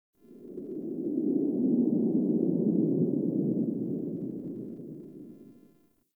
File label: Forest Cave Howl; Warframe; Grineer